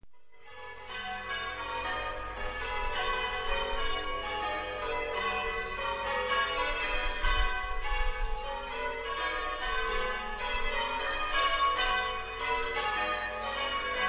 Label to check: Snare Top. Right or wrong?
Wrong